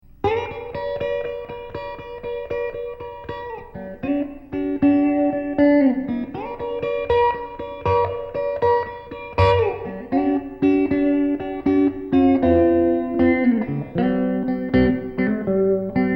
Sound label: Walkin Blues Short Effects